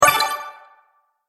Sparkle Strike, magic sound effects

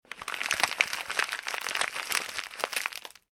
Plastic Packaging Movement

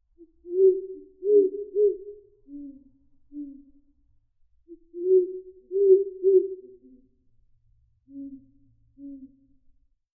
Owls Loop; Birds; Animals

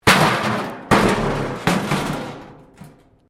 metal rubbish bin smash concrete; Metal (Sound Effects:Materials)

